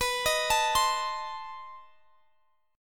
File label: B 7th Flat 9th, down, Guitar, Drop D